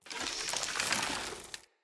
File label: Bow Ranged Charge; Weapons; Player; Bows; Grand Father Bow